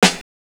SN, SNARES; USB Soundscan vol.20; Fresh Disco House I; AKAI

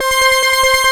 Korg, FX

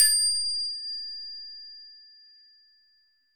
A7, temple bells; SoundBytes - Early Patches

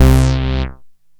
synt, Juno-2; Giga Samples Collection; Bass

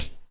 KIT, Dance Mega Drums, HIP; Best Service

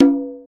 Bongo Lo Open, Roland BK-5; Percussion

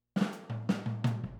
Boogaloo, Intro, Overhead, Drums, 82 BPM

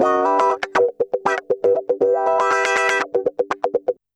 120 GROO WAH, Electric & Acoustic Guitar Loops; USB Soundscan vol.04; AKAI

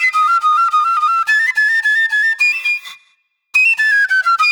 Eastern Flute

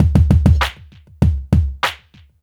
E BEAT L, Roots Funk; USB Soundscan vol.41 - Roots Funk; AKAI

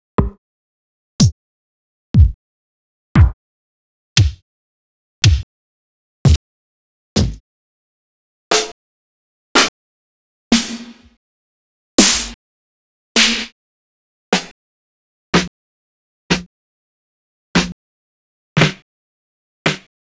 Vinyl BD SD; drums&percs (chains); X-Static Goldmine

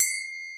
FING CYM, TONAL PERCS; Crypton - DrumBase5000

